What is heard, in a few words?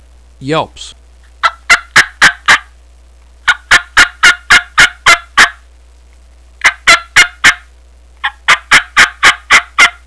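yelps, turkey call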